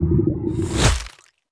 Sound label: Big Spit; Mawlek